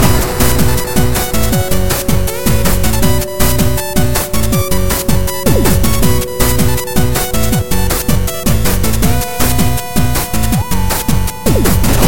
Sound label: 8 bit Showdown